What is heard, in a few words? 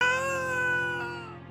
whiny death; elden ring